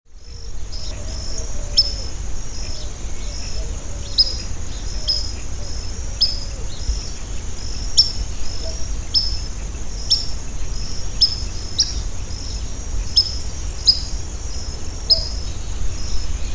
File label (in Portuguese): Maçarico solitário Tringa solitaria